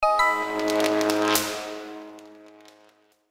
Electricity alarm; Nokia; audio themes

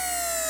Camera Zoom Out (Short); Samsung SGH-F300

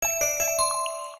Ringtone; Okapia; Z4

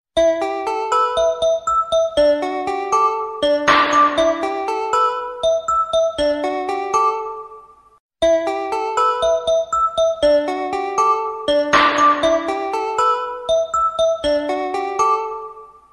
MSG Tone; Itel; it1350

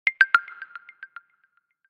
Message, notifications

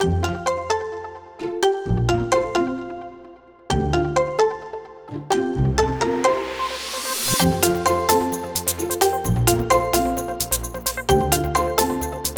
Plucking, ringtones; Honor; X7C

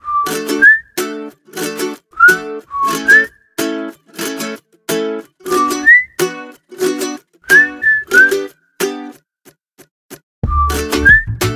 Pleasant Whistling, alarms; Rollable LM R910N; LG

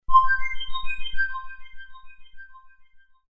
Power off, phone; NGM

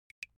Unlock; Tecno Spark 40C; ui

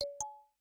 Charger Insert; ZTE Nubia Red Magic 8 Pro Plus; ui

